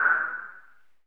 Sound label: wind